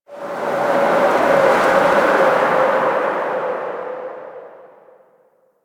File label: Exterior Forest Wind; Warframe; Ambience; Duviri